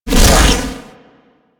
Shield Launch; Enemies; Weapons; Warframe; Corpus; Arachnoid Camper

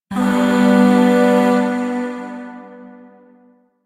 Shawzin Maj Chord H; Music; Chords; Warframe; Zariman Void Shawzin; DE

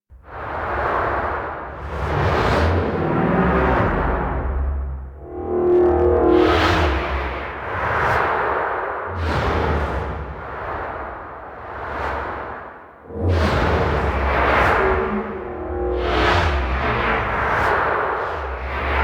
Amphitheatre Structure Loop; Warframe; Duviri

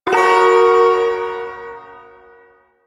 Ersatz Shawzin Hirajoshi Chord; Duviri

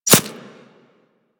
Arrow Impact Flesh; Warframe; Weapons

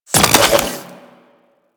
Split Laser Reload Stop, Weapons; Warframe; Corpus

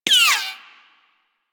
Bullet Impact Ricochet; Warframe; Weapons

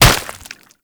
Golem Gun Projectile Hit; Warframe; Attacks

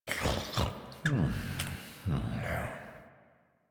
Snores; Warframe; Deimos; Father; Vocalizations; en

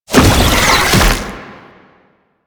Proto Infested Hover Start; Warframe; Brachiolyst; Abilities

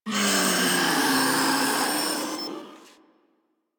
Proto Infested Ancient Death, Enemies Vocals; Warframe

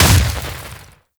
Ice Proj Hit C; Warframe; Corpus; Arachnoid Camper Terra; Abilities

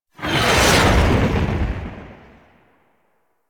Fly By Quick; Blue Sky Liset

